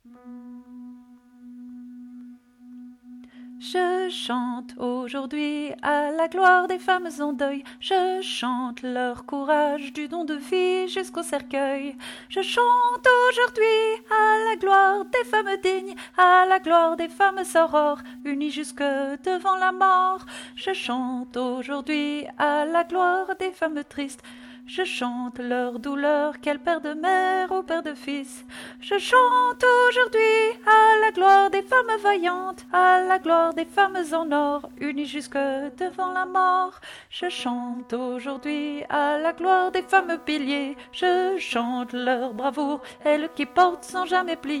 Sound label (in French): VOIX Soprano; LA CHORALE ANARCHISTE